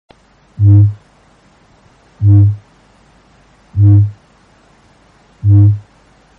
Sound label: kakapo boom